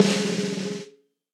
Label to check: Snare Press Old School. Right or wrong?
Right